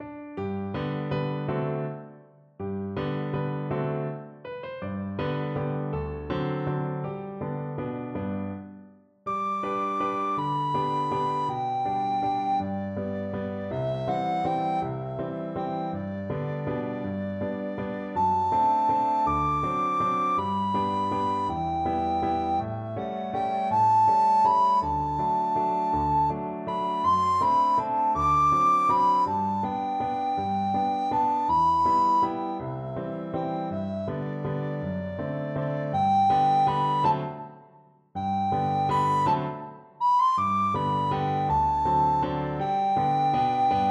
daisy, recorder

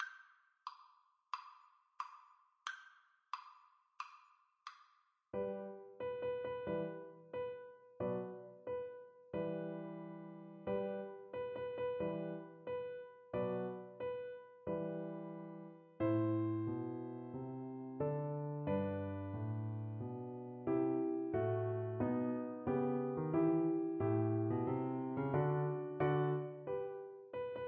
French Horn

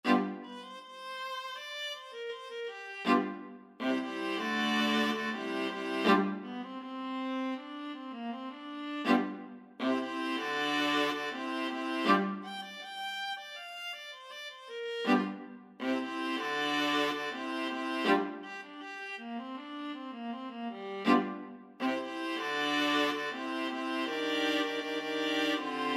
viola ensemble; happiness is the truth

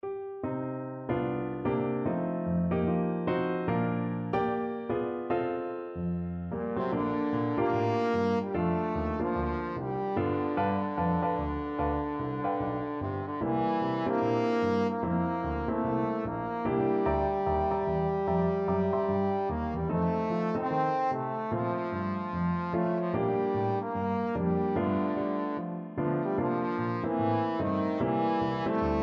alice blue, trombone

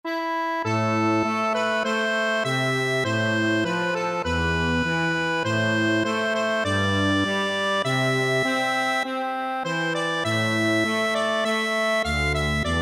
accordion

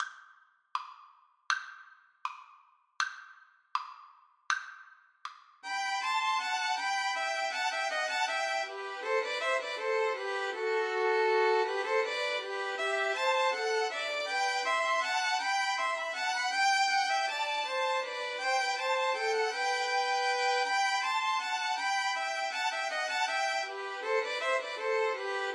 bourree, Violin Trio

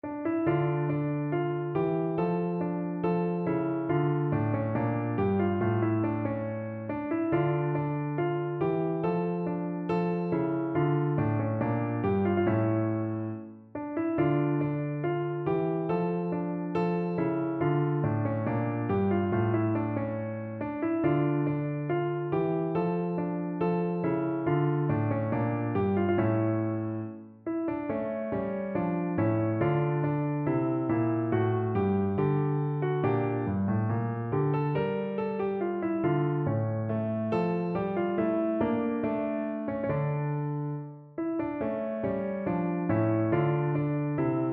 bourree dm, piano; visee

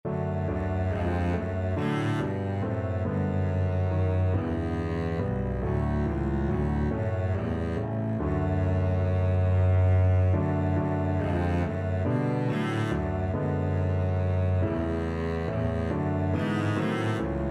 turn your eye, double bass

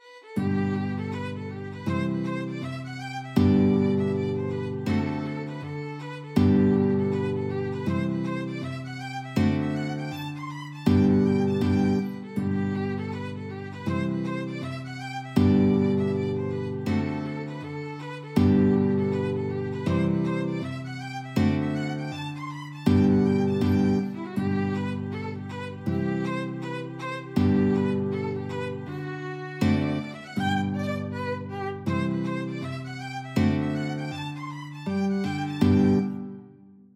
violin guitar duet

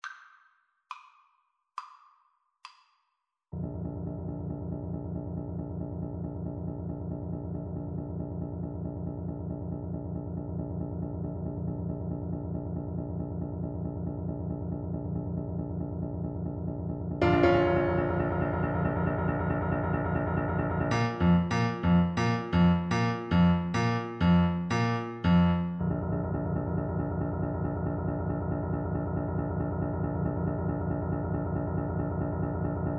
also sprach zarathustra, Tuba

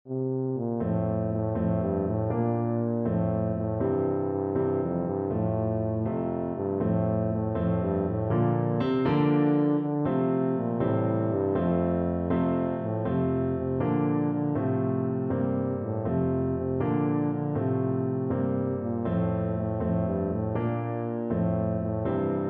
for hes a jolly good fellow, tuba

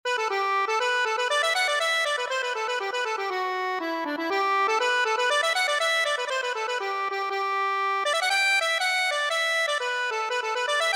accordion

